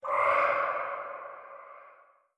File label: idle wet, headcrab reviver